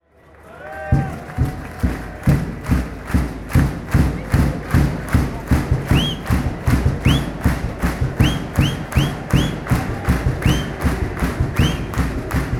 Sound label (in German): trommeln und pfeifen fanchants